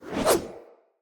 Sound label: Dagger Whoosh; Weapons; Player; Swords; Josalfar